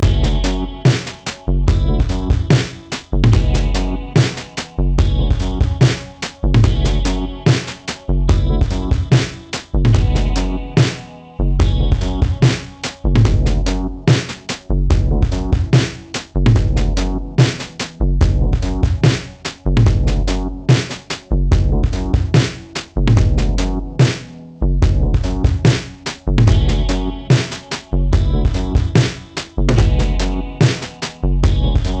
Beat

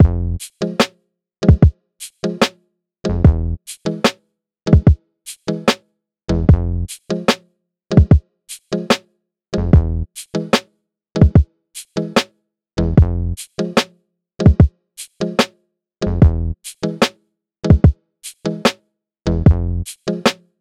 Simple Hiphop Beat

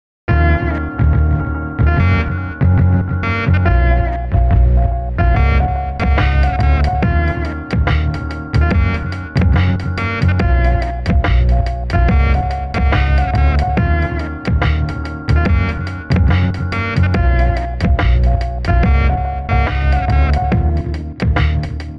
Lofi Mix

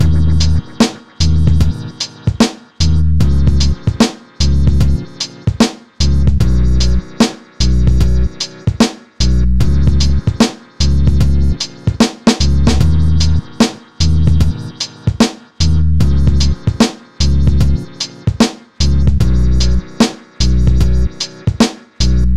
Steady Rap Beat

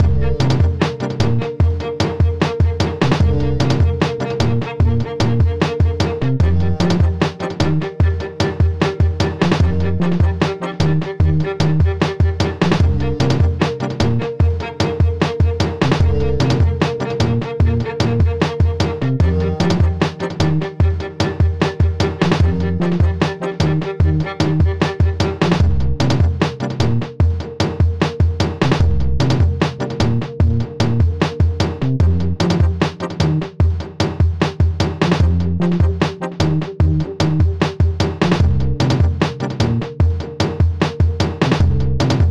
Smooth and Emotional Hiphop

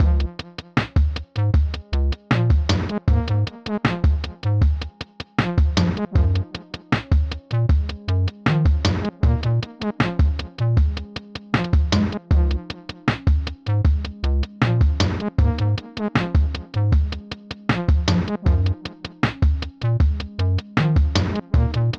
Mellow Rap Beat

